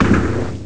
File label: CANNON